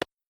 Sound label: single clap